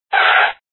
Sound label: skid